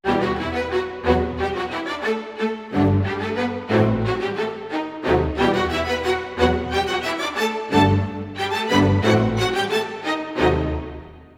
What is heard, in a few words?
90 e min strings, Orchestral arrangements